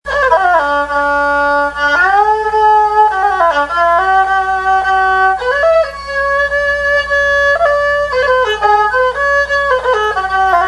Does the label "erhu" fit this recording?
Yes